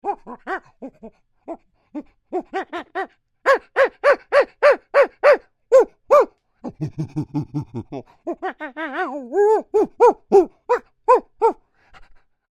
Creature Monkey Grunts Excited, Human Imitates Monkey; Monkeys; BigRoomSound